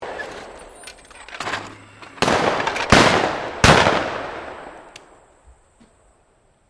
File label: Clay Pidgeon Launcher Automatic And Shotgun Firing, Gun Club sounds; Miscellaneous Sports